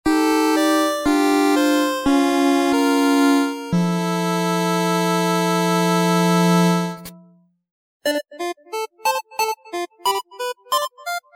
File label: Game Over (8-Bit)